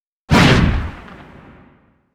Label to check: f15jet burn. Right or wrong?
Right